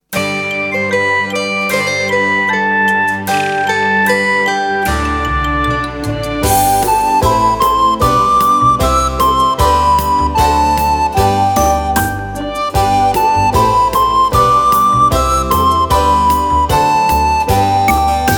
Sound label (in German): Ein Männlein steht im Walde Listening, recorder